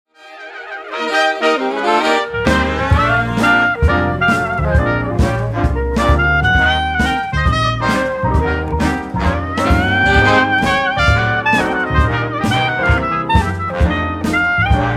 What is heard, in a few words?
Karaoke Version